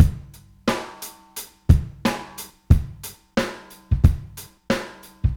Drum loops Boombap

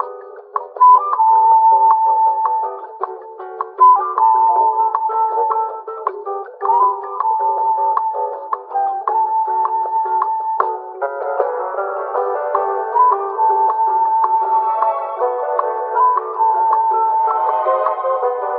flute, Loops, Phonky

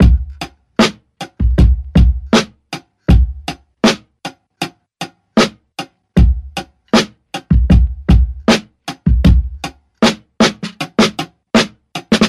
Drum loops Boombap

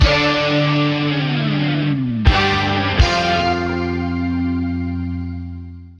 buzzers

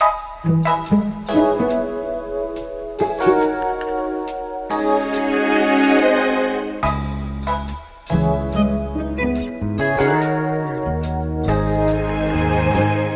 keys sensual; loops; Phonky

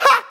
"ha", Voice

